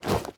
equip leather, armor; Minecraft; neoLegacy

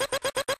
red alert loop; Minecraft

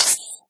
creeper; mob; Minecraft

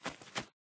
fly, parrot; Minecraft

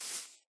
grass, step; Minecraft

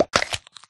drop egg, turtle; mob; Minecraft

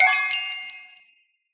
diamond point; Minecraft

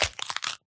egg crack, turtle; mob; Minecraft

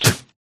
spit, llama; Minecraft

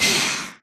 hurt, phantom; mob; Minecraft

